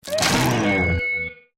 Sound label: splat